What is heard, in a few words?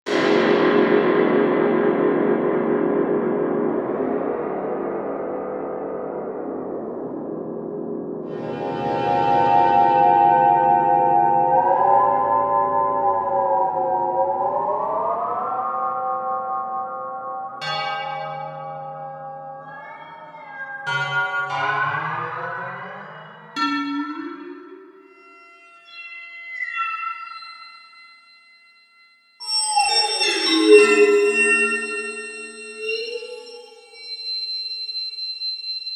Water Phone